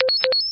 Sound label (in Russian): dvojnoj signal prinyatiya vizova